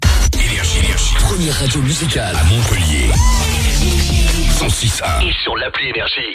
JINGLE, Première Radio (NO WAVE); MONTPELLIER, 106.1 FM; NRJ

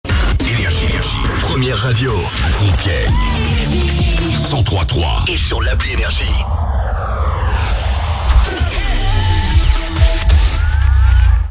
JINGLE, Première Radio

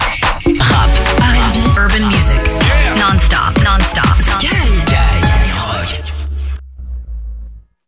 JINGLE NOEL; SKYROCK; SKY 2025

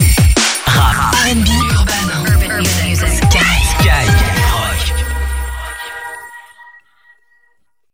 JINGLE NOEL; SKYROCK; SKY 2025

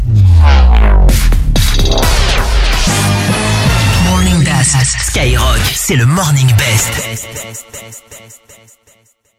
JINGLE MORNING; COMPACTE MORNING BEST 2026; SKYROCK

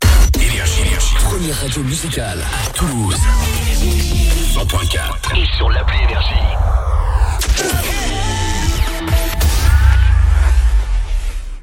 JINGLE, Première Radio; TOULOUSE, 100.4 FM; NRJ

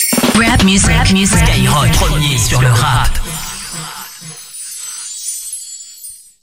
JINGLE NOEL; SKYROCK; SKY 2025

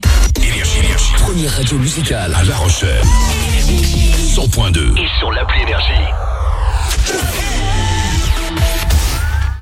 JINGLE, Première Radio; LA ROCHELLE, 100.2 FM; NRJ

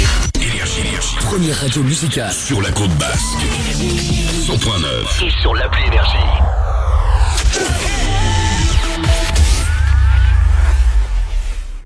JINGLE, Première Radio

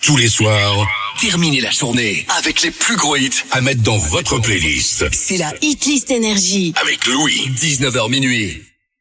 Acap; NRJ

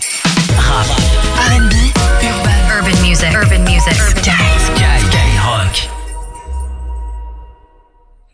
JINGLE NOEL; SKYROCK; SKY 2025

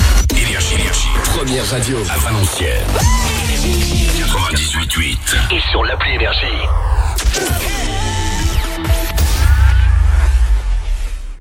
JINGLE, Première Radio; VALANCIENNES, 98.8 FM; NRJ

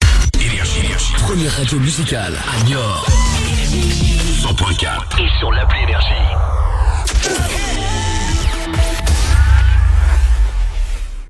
JINGLE, Première Radio; NIORT, 100.4 FM; NRJ

